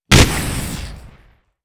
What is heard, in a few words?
explode; g-toilet nextbot; GToilet SKIB FANMADE